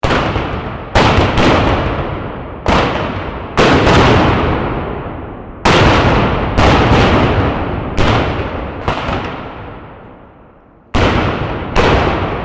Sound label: cannon battle